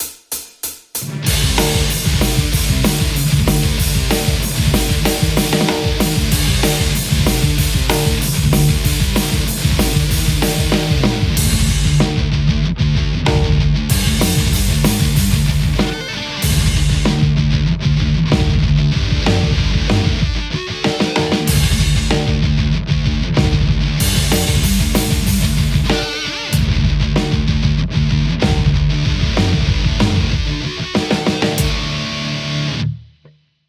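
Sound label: Telecaster; metal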